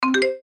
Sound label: beep